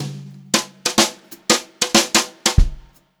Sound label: jazzy fill, Brushed Drum Loops; USB Soundscan vol.39; AKAI; 90 s Sample CDs